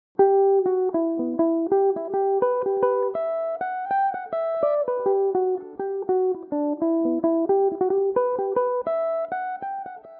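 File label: jig E dorian, irish mandolin, tunes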